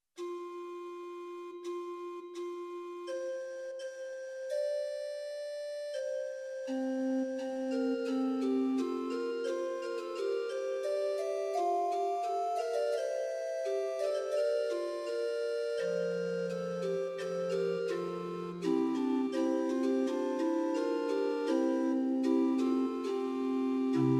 Short Organ Music, Pitch 440, Temperament Werckmeister, RohrF8